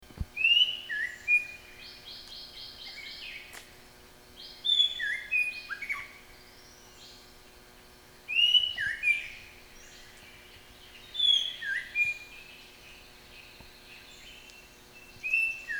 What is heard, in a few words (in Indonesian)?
Murai batu; juara nasional; Wikicau